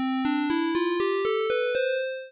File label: cmajorscale sfxr; audiolab